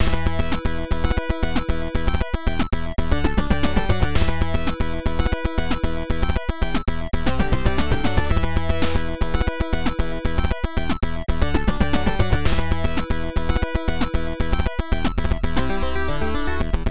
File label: Boss Battle